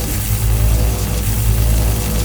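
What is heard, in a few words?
trap lightning spawn; monster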